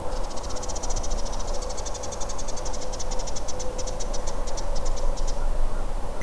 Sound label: Ruby crown kinglet; Ruby-crowned Kinglet